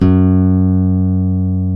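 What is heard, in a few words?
F#1 FLAMENCO, FLAMENCO guitar; WorldSounds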